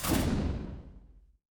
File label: magic scifi portal